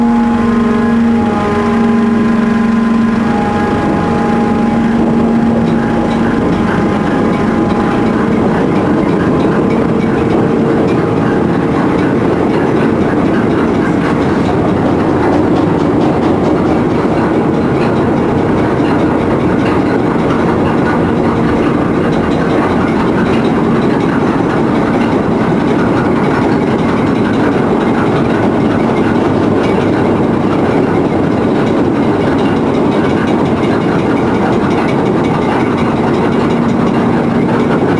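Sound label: Environment exterior Chain pulled ferry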